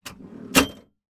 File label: van seat distance from pedals adjust mercedes sprinter